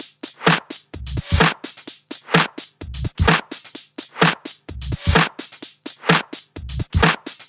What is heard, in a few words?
128BPM Kit; Vengeance Samples; VFH2 128BPM Cool Kit